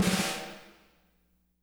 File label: SNARES; Spectrasonics; Burning Grooves; BG SNARES 4